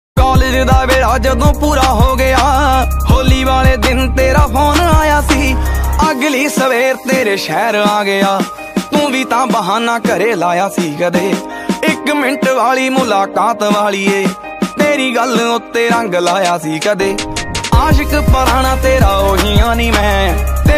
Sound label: punjabi song ringtone